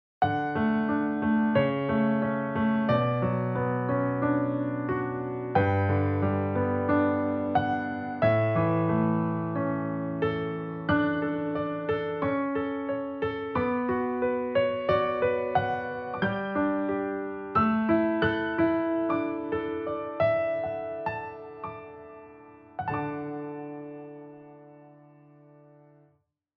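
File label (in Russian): успокаивающий ритм прекрасного вступительного рассказа фортепианного фонового звука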